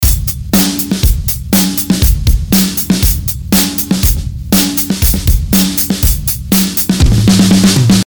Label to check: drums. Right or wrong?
Right